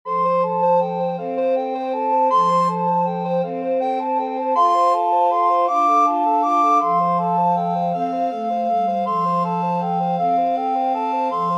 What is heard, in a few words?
recorder quartet